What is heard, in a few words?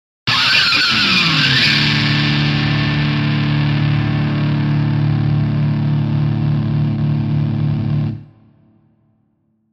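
Electric Guitar Plectrum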